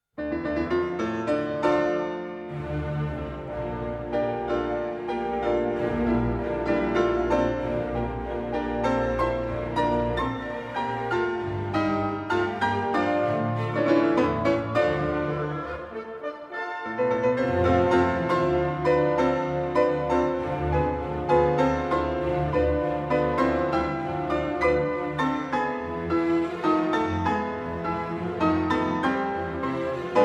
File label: concerto